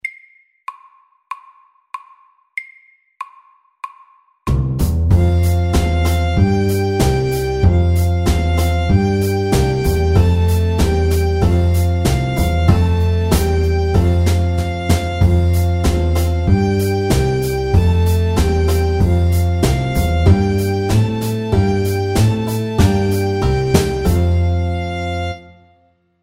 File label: Square dance SAKTE